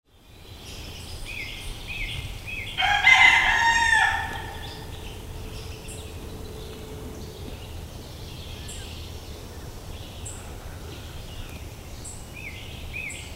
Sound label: Rooster and Wood Pecker, Sounds of Nature